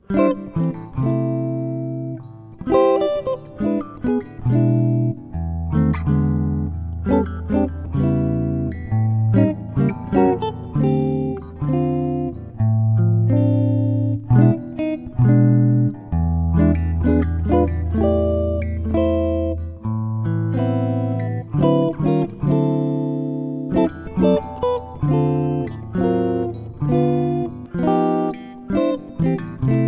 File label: Chord Melody; instructional materials; jazz tunes